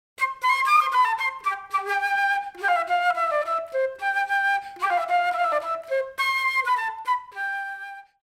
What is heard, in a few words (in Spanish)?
flauta